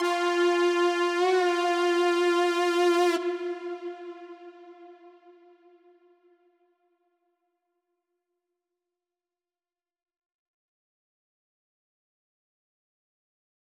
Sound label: Breakout Frantic Mix Stem Layer; Breakout Recharged